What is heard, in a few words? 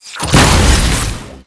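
Heavy Tank Rail Gun Normal Attack; blue archive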